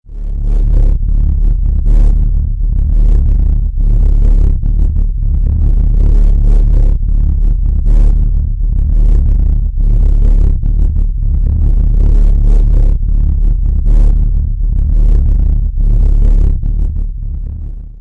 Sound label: flutter of death bass; Basses